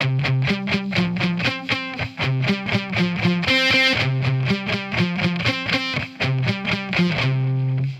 сэмпл гитары rock alternative

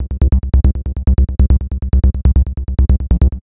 Soft Fast, бас сэмплы техно транс

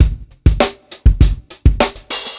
сэмплы ударных в стиле funk

